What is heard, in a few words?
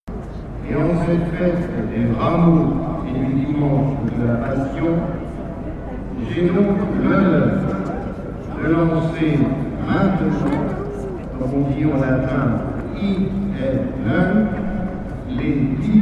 CARILLON